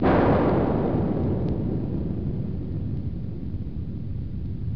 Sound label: scifi